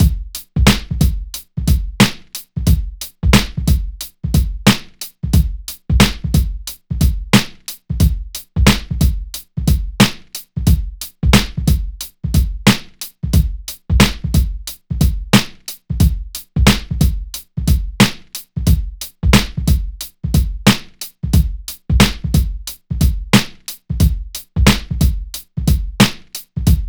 90 Tite Bouncehard, Breaks; Lockdown Breaks

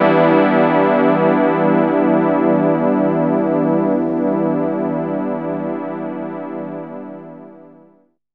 DR. LOOP, TRANCE; X-Static Goldmine 3

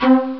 Breath Chiffer; ST-XX Amiga Soundtracker Sample Packs

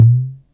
SYNCUSSION

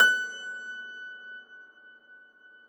Thumb Tack Piano ff ped; Total Piano

